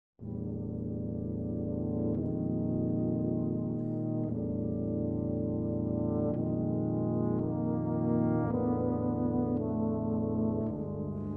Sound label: Music 4 Tubas, Chorale